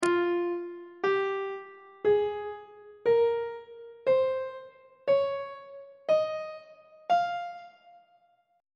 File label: f aeolian mode note positions; on treble clef